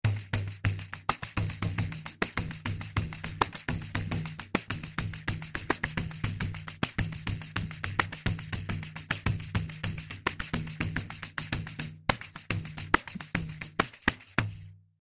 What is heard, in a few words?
pandeiro